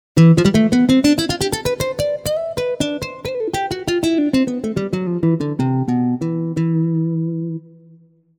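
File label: Nylon Guitar; TURBO VL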